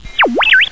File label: computer, Weird and Interesting Hits; single hits